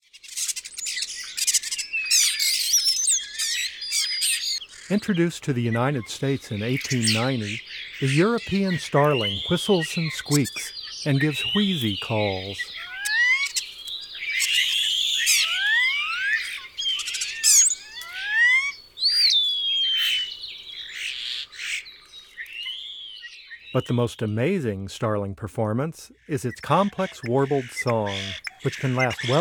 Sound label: European Starling; North America; Common Western Species; Know Your Bird Sounds